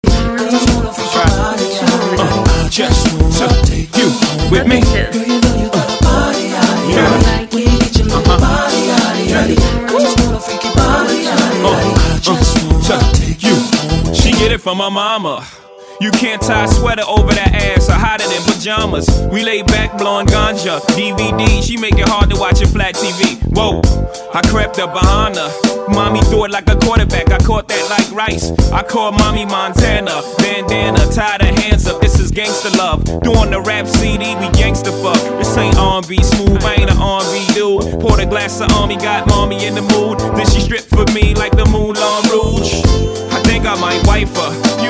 Classic Hip Hop Club Party MashUp

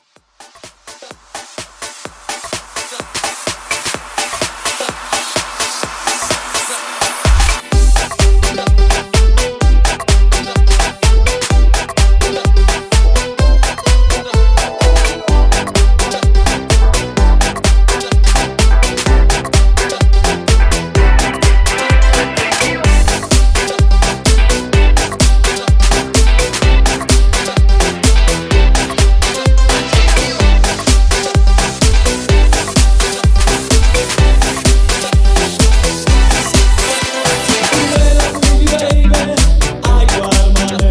80s Electropop House 127 BPM

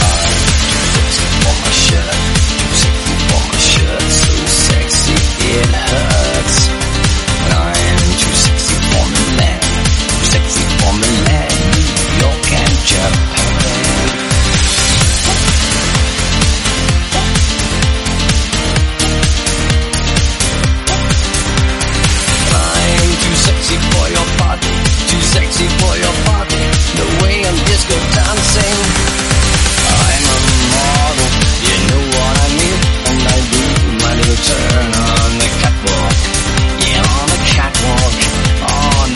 Snippet 128bpm Fm

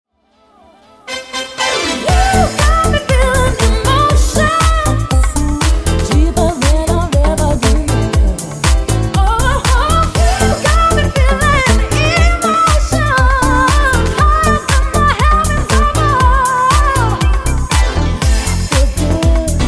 Disko Funk 119Bpm

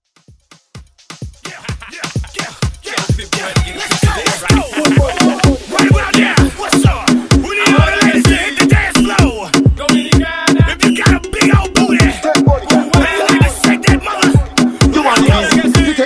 Dance Riddim Afro

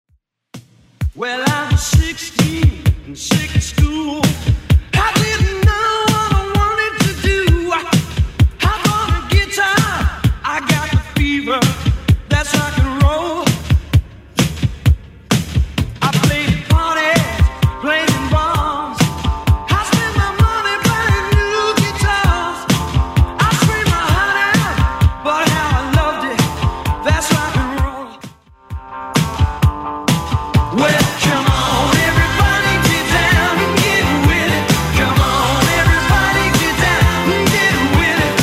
80s 130bpm; Redrum

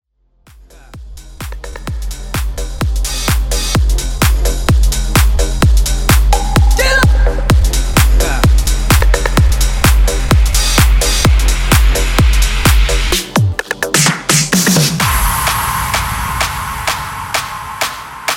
Epic Mashup 128Bpm

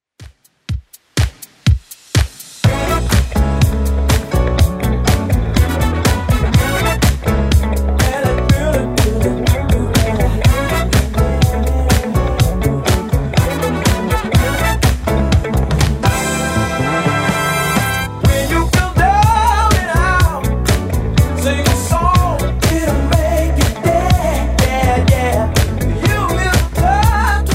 70s, 123bpm; Redrum

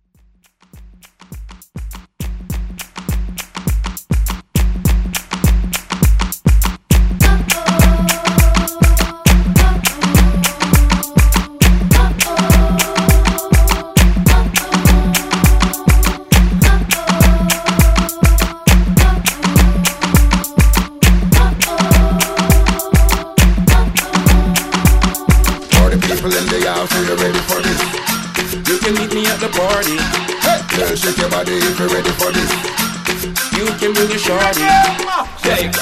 Throwback Party Break

